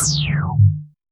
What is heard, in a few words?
a space ship hit by a; 80s